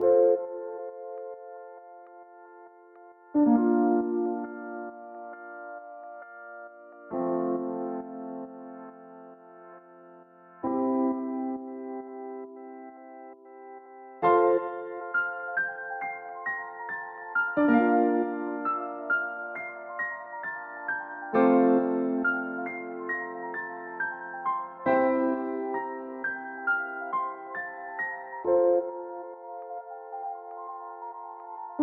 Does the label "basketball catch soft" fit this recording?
No